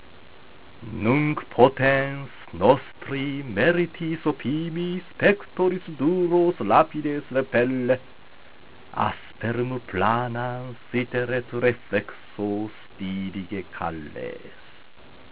Utqueant, latin

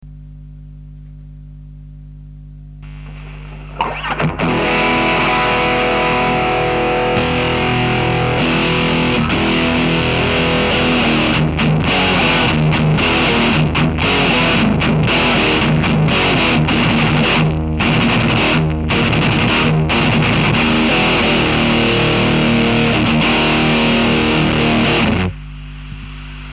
BOSS GE-7 Equalizer, CLEAN DRIVE

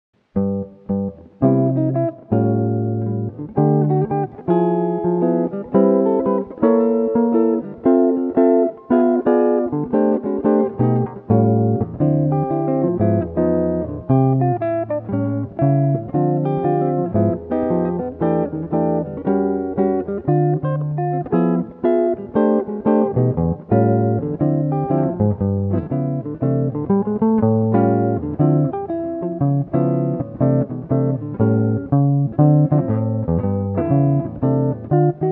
S Guitar

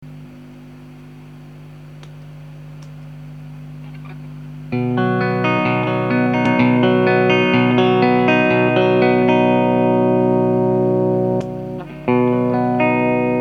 dyna comp, CLEAN COMPRESSION; MXR; OUTPUT5 SENSITIVITY6; 出すぎた音を抑えて音の粒立ちを揃えて聴きやすくしてくれる。

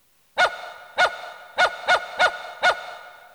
dog

